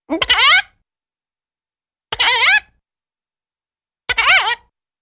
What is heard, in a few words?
Funny Sgl Chicken, chickens